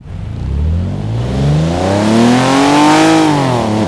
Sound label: first, vehicles; gmod; tdmcars; syclone